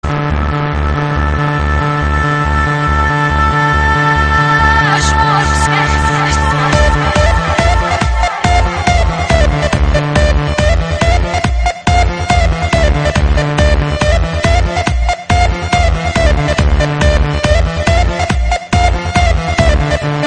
musical chairs; cstrike; jb rush

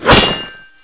knife hit wall; cstrike

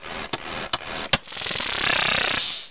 nature bzzzz; cstrike

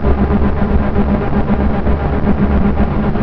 alien builder; cstrike; solid

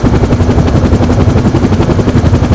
heli; ambience; blaze; cstrike

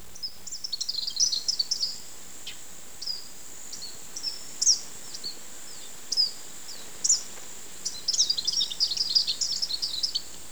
birdy; cstrike; kz ins bhoptown

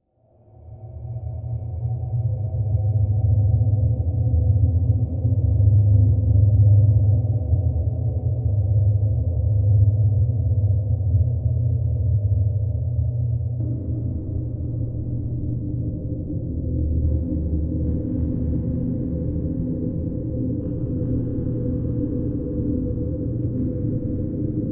scary temple; cstrike

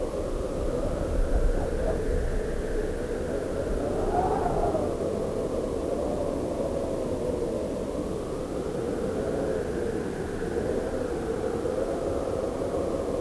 mountain wind; cstrike

